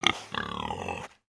Pets Warthog Beg; creatures; Lego

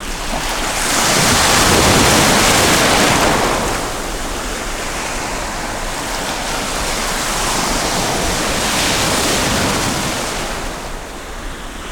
Waves; Pet Rock; ambience pet rock

